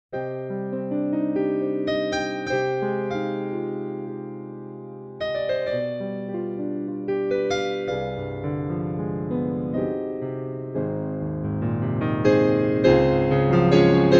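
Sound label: dynamic jazz piano power sound played relaxed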